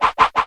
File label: hatchet miss; wesnoth